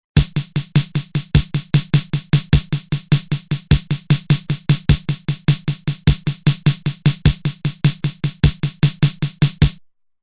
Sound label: Fill In 4-4, Roland TR-505; Factory Rhythms